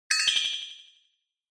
Accent

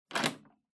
Public Phone Coinbox Unlock

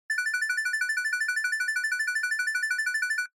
Censor Bleep Synth, Long

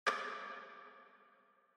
Clock Minute Hand Reverb

